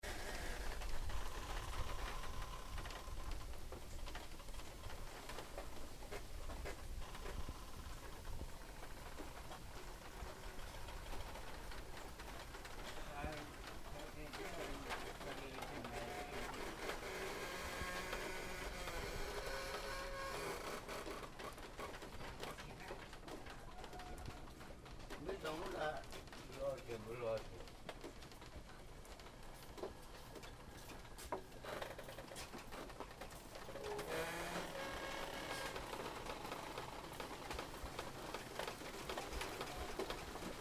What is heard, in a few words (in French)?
bruits bambou